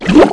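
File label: water under, player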